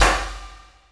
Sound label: 98 HALT SD, SD KIT, Industrial Loops; USB Soundscan vol.24; AKAI